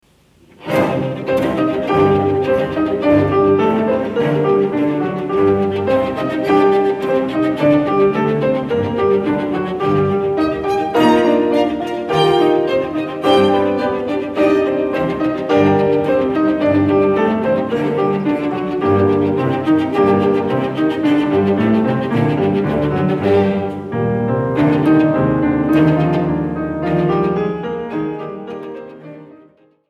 Quintet for Piano 2 Violins Viola and Cello In Eb Minor Allegro ma; Schumann; Op.44